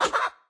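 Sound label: Chicken base attack, 经典及以上形态攻击音效; 母鸡会用自己的喙不断地啄对手; 攻击音效 atk; 绿野世界; 卡通农场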